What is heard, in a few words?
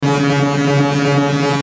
Electrical Chorus Buzz Warble; Blastwave